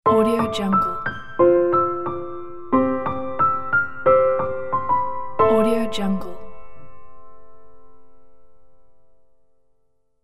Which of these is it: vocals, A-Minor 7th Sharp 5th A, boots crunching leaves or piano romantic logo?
piano romantic logo